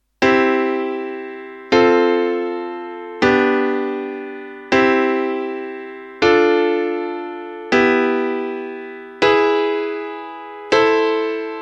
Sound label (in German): akkorde gleich; harmonie